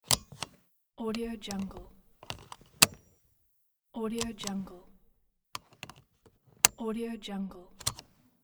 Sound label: car seat belt buckle clicking and unclicking